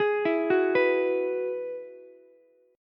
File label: Ab Minor 7th Sharp 5th Ab, Ukulele; arpeggiated; 13,16,14,14